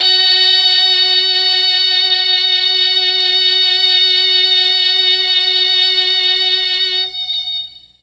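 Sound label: F#3 Strings Brass, Mellotron; Mike Pinder - Mellotron Archive CD; STRGS+BRASS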